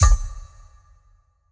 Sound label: word correct